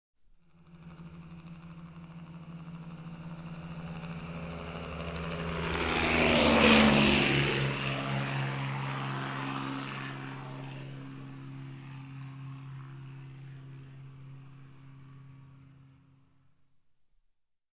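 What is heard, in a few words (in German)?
Luftwaffe Vorüberfliegender Hubschrauber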